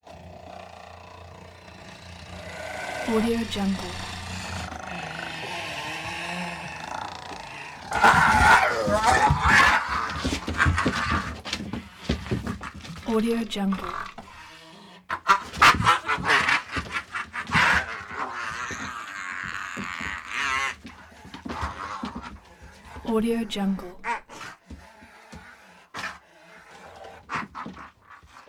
striped hyena asthmatic breathing nasty laughs; prosoundfx

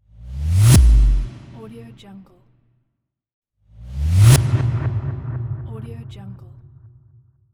bass swoosh

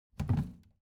object plastic trash can open regular

